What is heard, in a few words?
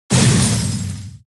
arrow explode; Blue slush cookie